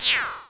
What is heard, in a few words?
effects, drum machines, FutureRetro FR777